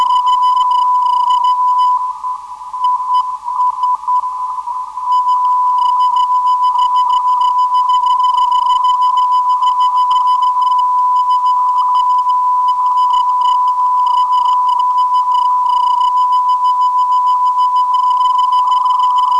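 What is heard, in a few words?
non-directional beacon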